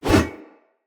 One Handed Axe Elite Whoosh; Weapons; Enemy; Axes; Sinecure One Handed Axe Elite

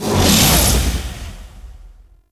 Despawn; Lyon; Warframe; Tau; Roathe

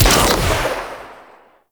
Arch Prisma Shot, Rocket Crossbow; Warframe; Archwing; Fluctus

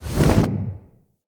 Exit Aim Glide, Foley; Tenno

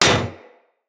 Bullet Impact Generic Enemy Shield

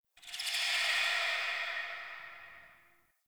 Ext Bat Chatter; Ambience; Misc Outdoor; Warframe; Infested Eris

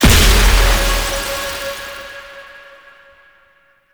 Crystal Growths Impact; Citrine

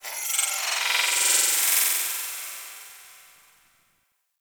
Tech Texture Small; Warframe; Ambience; Cephalon Weave

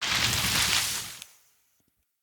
Rage Vines; Fey; Champion

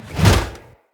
Shield Whoosh; Weapons; Enemy; Blunt; Hammer And Shield Weapon

